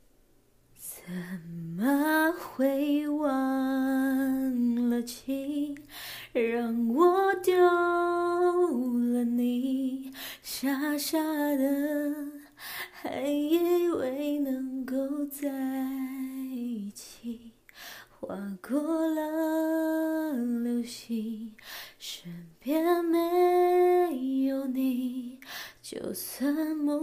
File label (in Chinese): 歌曲演唱